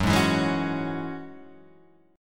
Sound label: Gb 7th Suspended 2nd, Gb7sus2 chord; strummed; JGuitar